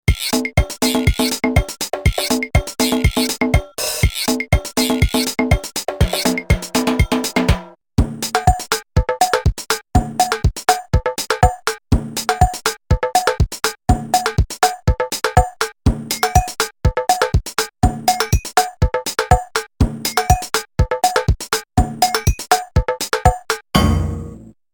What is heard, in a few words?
TOM Latin Perc